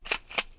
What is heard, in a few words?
handgun reload